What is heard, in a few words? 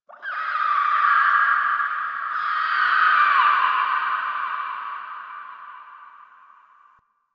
Interior Texture Painful Screech; Ambience; Misc Indoor; DE; Warframe; Infested Eris